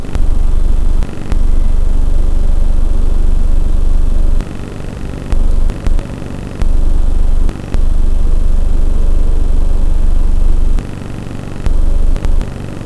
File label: tones